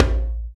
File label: Afro Drum Open; Roland BK-5; Percussion